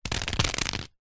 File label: water drips sudden thin plastic wrap; nature